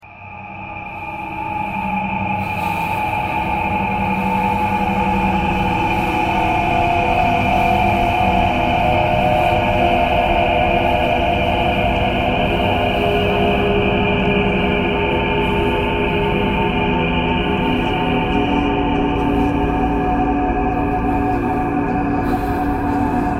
traffic core birds thread noise

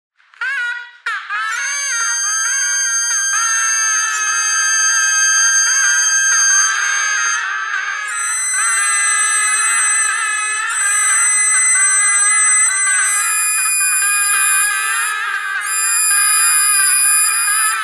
bagpipe geese thread noise

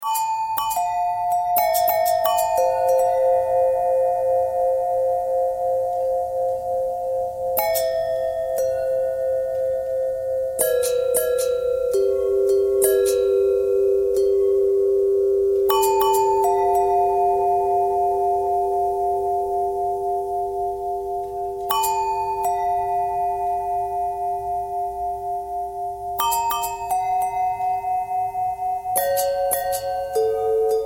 long bell thread bend thread; bells

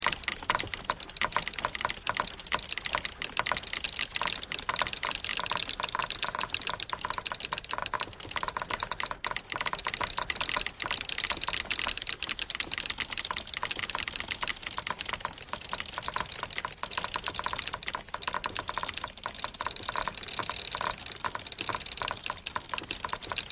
typewriter thread pentatonic all

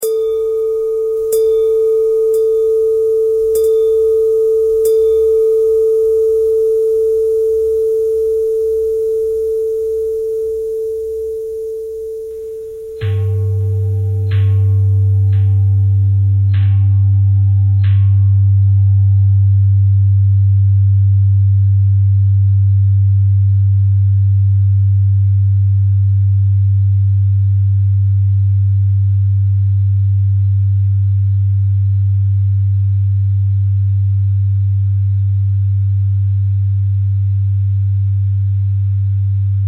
bell threads

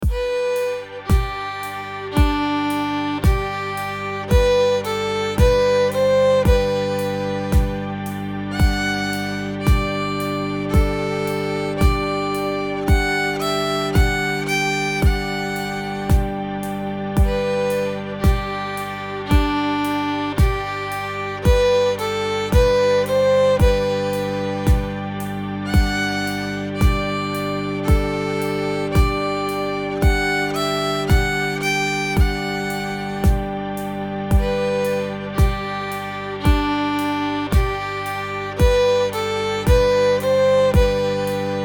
practice loops fly away fiddle and transpose 5th

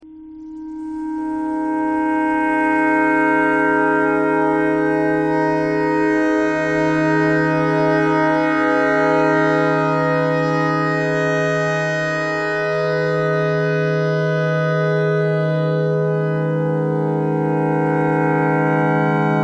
clarinet notes e clarinet thread pentatonic

